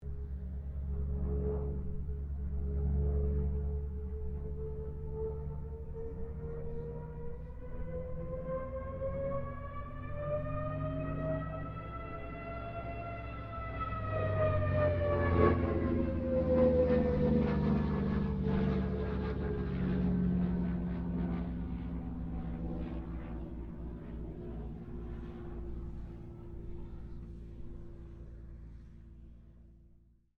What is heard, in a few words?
AIRCRAFT CA-13 BOOMERANG PASS STEREO